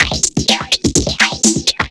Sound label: drum loops; bb drum loops